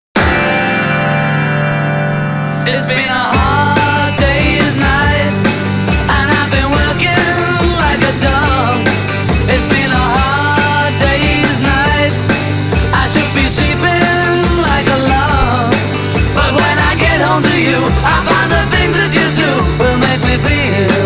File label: rock band men laser